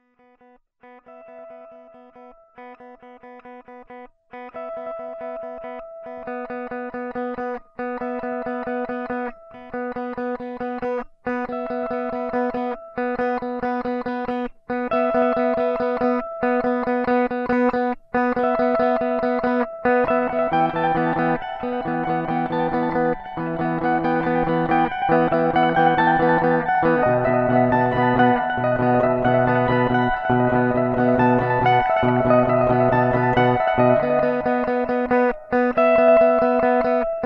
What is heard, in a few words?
Trickle